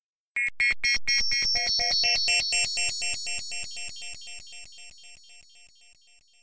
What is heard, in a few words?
strange tinnitus; anime